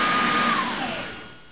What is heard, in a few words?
Compressor SL20 End